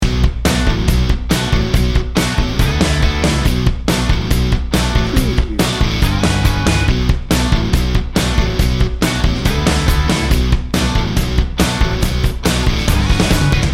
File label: night work loop